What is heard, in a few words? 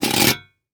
Industry Tool Saw Scraping Wood Short